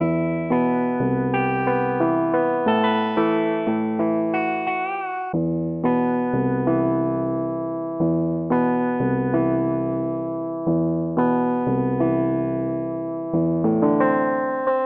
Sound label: guitar melancholic, Loops, Sad